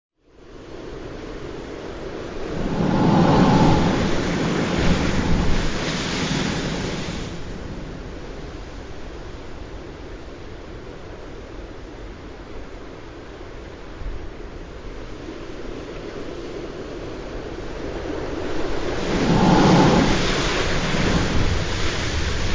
spouting horn

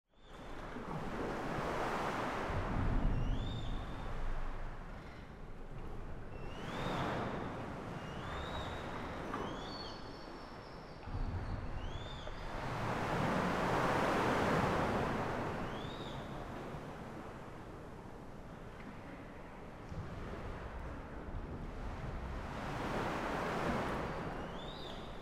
Lehua sea cave

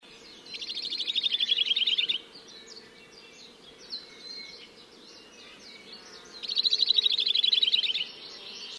hawaii creeper

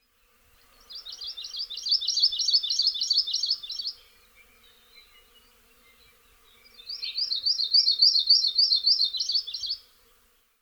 kauai anianiau

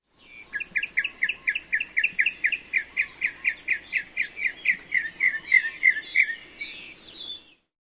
Mocker

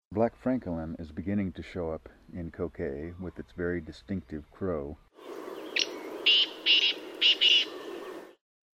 Black Francolin; Voices Kauai

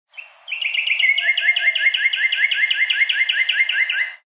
Northern Card

